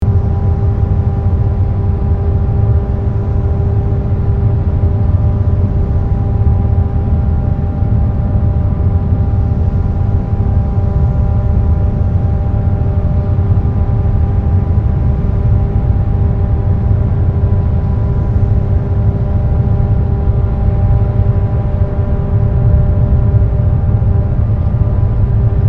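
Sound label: Ambience Large Train Station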